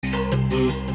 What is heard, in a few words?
KORG VORTEX; EMANUELE BALLASINA